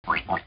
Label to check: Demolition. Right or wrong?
Wrong